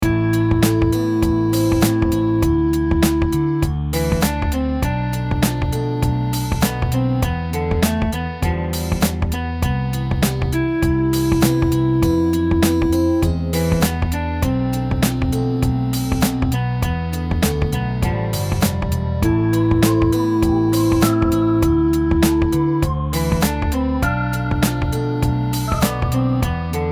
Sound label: Serious Conversation; YIIK A Post Modern RPG